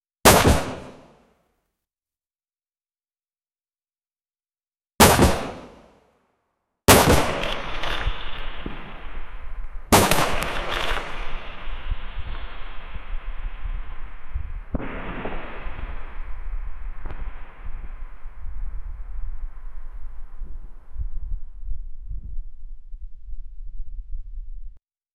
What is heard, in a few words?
ar 15 firing sound